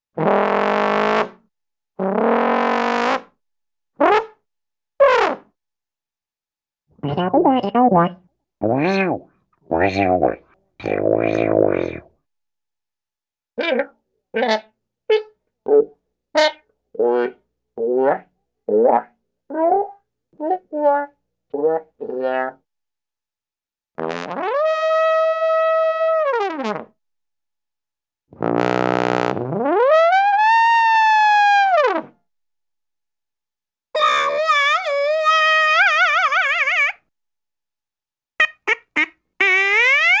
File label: Trombone - Brass Vocalisations; Cartoon; Hollywood Edge; Cartoon Trax